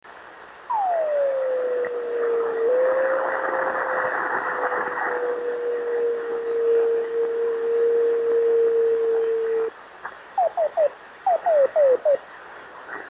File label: 28161KHz